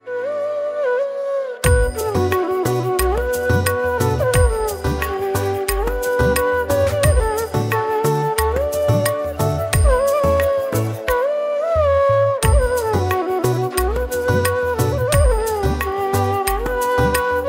Flute Indian Version Ringtone

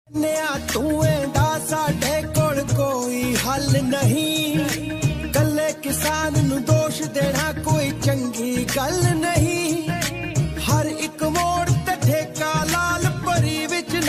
Punjabi Ringtone

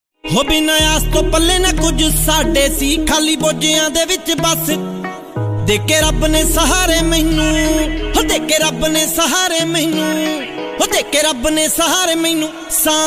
Dance Punjabi Ringtone

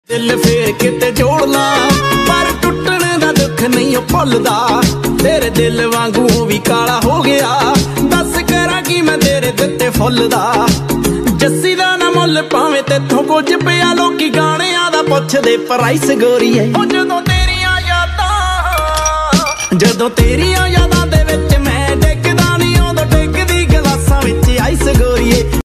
DJ Punjabi Ringtone

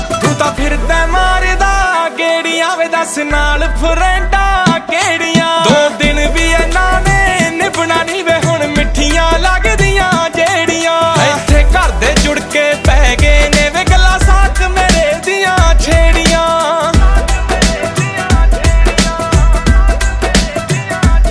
Punjabi Ringtone

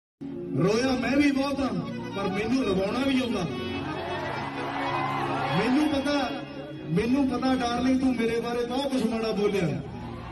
Dance Punjabi Ringtone

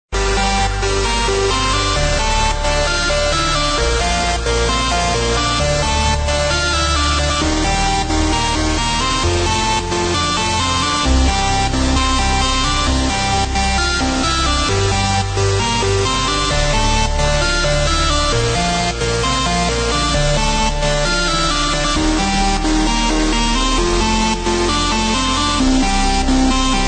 Techno Atmosphere Ringtone

